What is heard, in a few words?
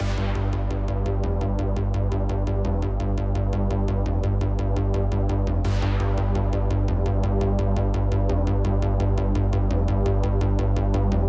Bass Intro; Metagalactic Bass Intro; metagalactic blitz